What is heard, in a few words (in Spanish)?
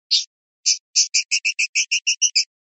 rep cosqueos, pardillo